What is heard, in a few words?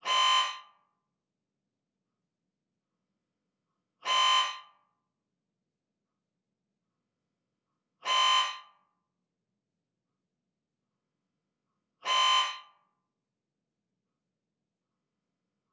siemens 3t magnetom prisma fit whole body human scanner mri epad asl